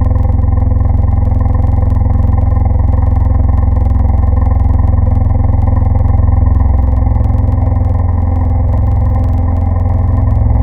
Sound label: VVO Ahhh; Synthetic's Serum Collection; Echo Sound Works Grey V.1